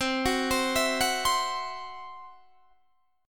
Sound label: C-Major Flat 5th, Guitar DADGAD